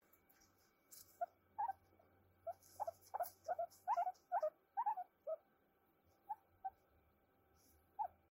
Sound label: Whining